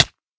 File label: hit, damage; EaglerForge; minecraft